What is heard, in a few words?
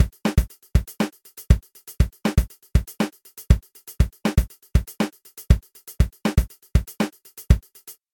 Beat Dry